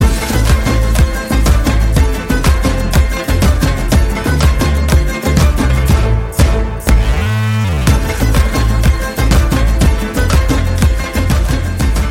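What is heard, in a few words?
background music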